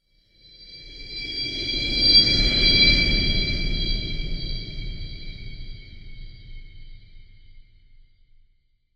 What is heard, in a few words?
science fiction spacecraft fly by; sound effects one